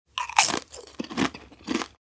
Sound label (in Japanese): スナック菓子を食べる